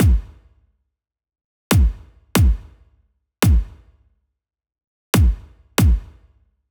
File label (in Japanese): キック